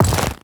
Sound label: leather equip; inventory